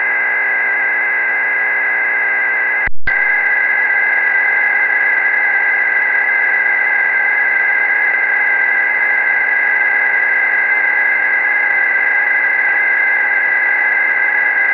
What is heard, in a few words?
VLF 23k USB 60Hz Comb; SSB reception at; 23 kHz; In this clip, the same receiver was tuned to about 23 kHz in the presence of the same power line interference as above